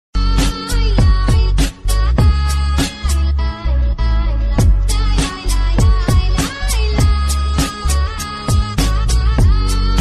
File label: Mobile Ringtone